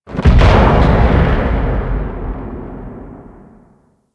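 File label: Ship Distant Damage Explosion; Warframe; Corpus Ship Remaster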